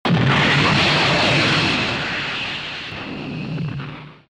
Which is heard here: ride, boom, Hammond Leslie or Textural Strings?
boom